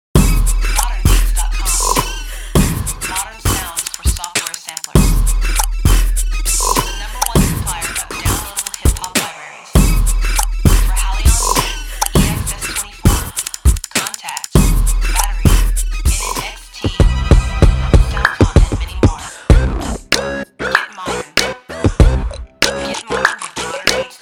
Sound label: Beat Vox